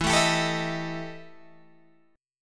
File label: E Minor Major 7th Double Flat 5th E Guitar Open G